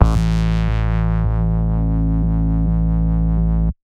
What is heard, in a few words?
Tech Bass A0, techno; musicradar; Bass Multi